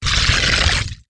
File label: dragon attack; khanat; megaglest